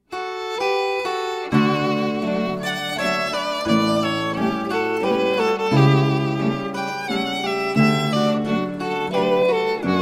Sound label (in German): Langsamer Landler, zither